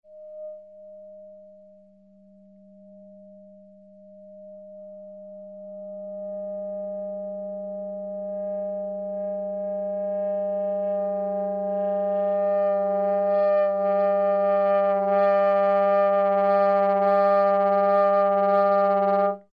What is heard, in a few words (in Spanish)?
Morphing